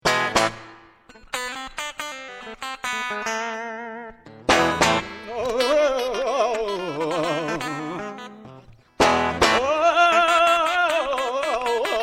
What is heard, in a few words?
flamenco